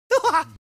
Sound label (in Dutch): lach; kabouter klus